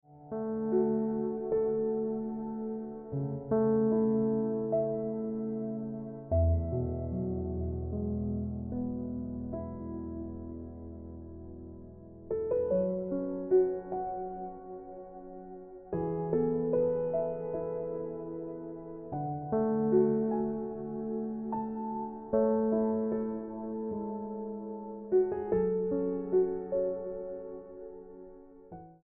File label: Into The Woods Ringtone Piano Music